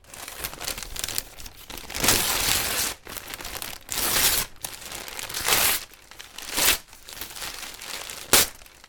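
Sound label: tear news papers, 新聞紙たくさん破る; 新聞紙たくさん破る３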